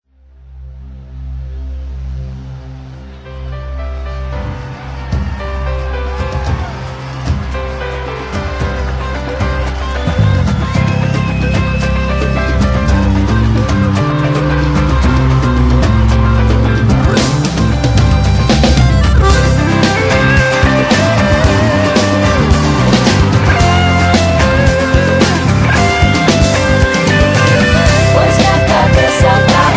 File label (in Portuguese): abertura